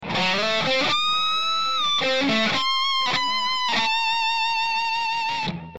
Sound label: Distortion Harmonics